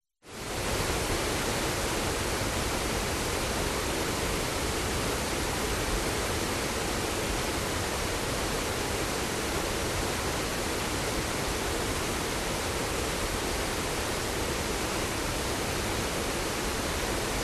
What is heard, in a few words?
Water Fall; Audio Hero